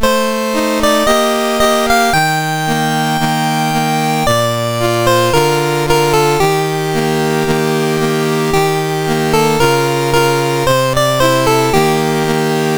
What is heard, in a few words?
MOS6581R4, National Anthems; Morocco; Dave Marquis